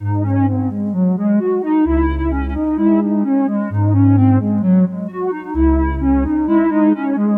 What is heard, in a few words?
Various Melody Loop - 130 BPM C# Min Synth; Melodies; Loop Stems; Cymatics; Oracle Sample Pack; Oracle Various Melody Loop 8